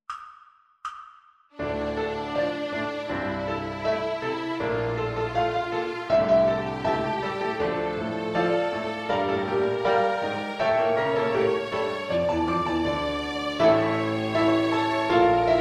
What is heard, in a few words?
D minor harmonic 1 octave Violin Piano Backing Track; ViolinSchool